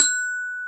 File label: GLOCKENSPIEL F#3; SoundBytes - Early Patches